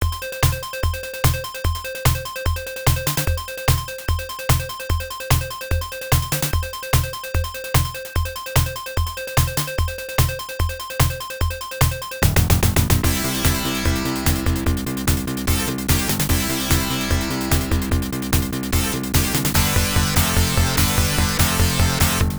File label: Goal; RoadBlasters; Atari System 1; arcade